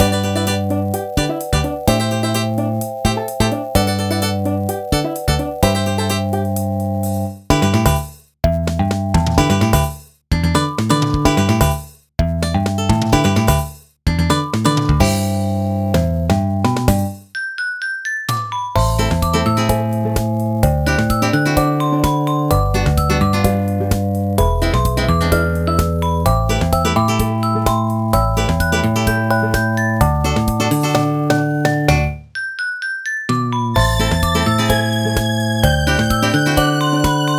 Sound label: Pop Stage Karaoke; pinky street kira kira music night pop town gamerip